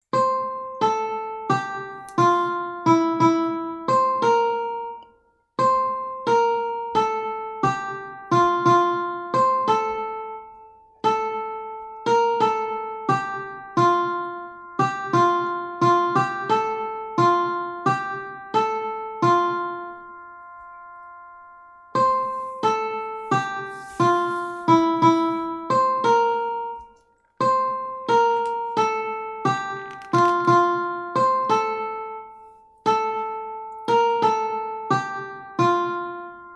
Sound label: Melody